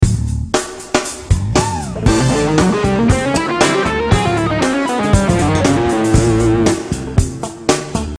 Lick

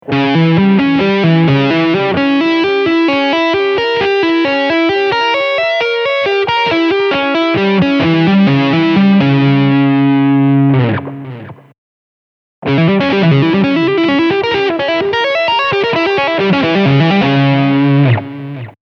String Skipping

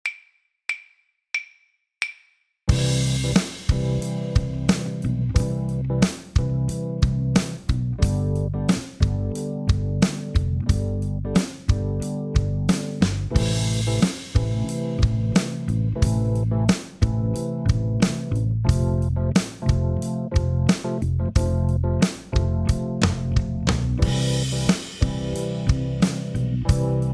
Backing Track

